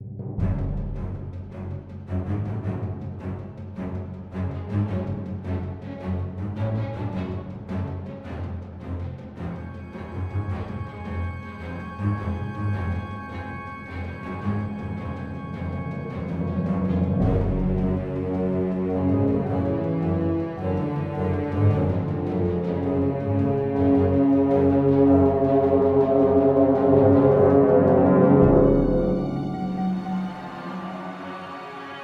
Starfighter Music; Galatic Starfighter

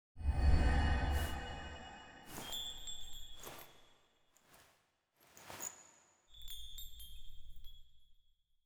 Bells; cutscene 1-3

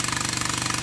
Platform Lowering; Marble Blast Gold

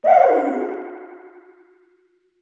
moan

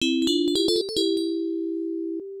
SE Confession Failure; doukyusei pc 9801

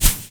run grass

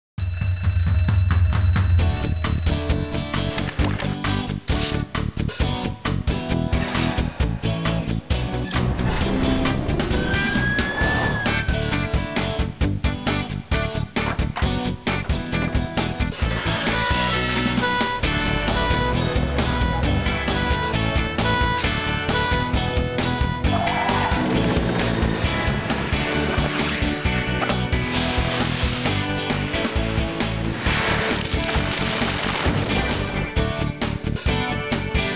Music Main Menu; Fireman Sam Always on Duty; NDS gamerip

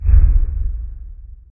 shadow action failed

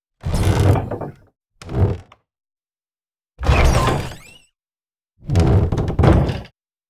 door multiplayer open

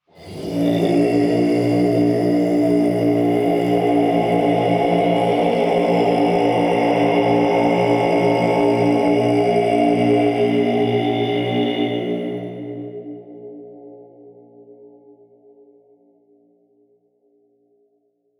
Throat singing; Oriental Empires; Mystery

